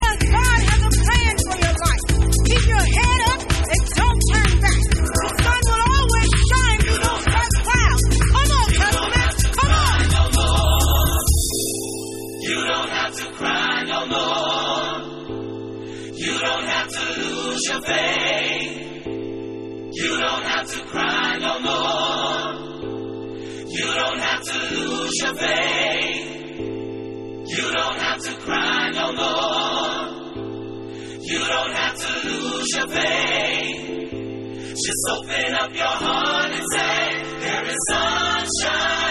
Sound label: Vocal Mix